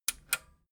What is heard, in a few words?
metal button radio click disable slow